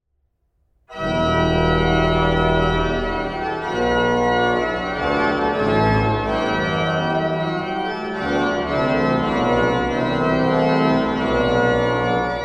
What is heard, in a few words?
Preambulum in d; Nicolaus Cracoviensis; tabulatura jana z lublina